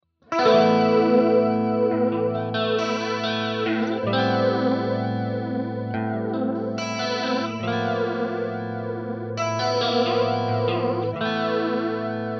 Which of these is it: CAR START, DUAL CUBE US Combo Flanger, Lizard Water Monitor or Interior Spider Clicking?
DUAL CUBE US Combo Flanger